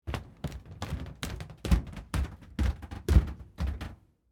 Carpet Stairs Down, Footsteps, Carpet, Stairs, Down, Underneath, Perspective; Blast Wave